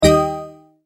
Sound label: Multimedia Tone-Stop; Sound Design; McKinney Sound